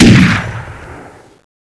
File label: saw fire